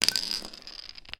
medicine vitamin tablet tube fall over on table and roll